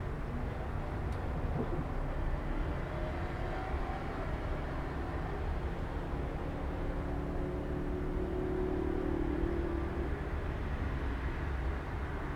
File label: City Streets By Night Ambient Atmospheres Sofia Bulgaria